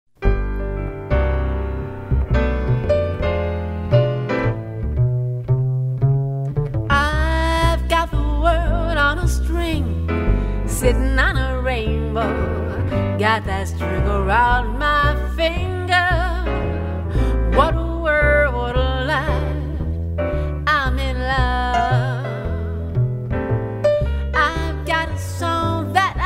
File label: jazz